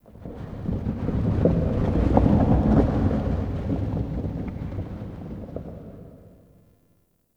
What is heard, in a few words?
Champ Crypt Distant Stone Texture, Ambience; One Offs; Champion Crypt